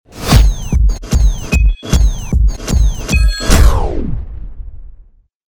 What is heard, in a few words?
mini bed with phone